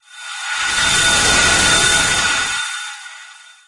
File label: electric Storm Swoosh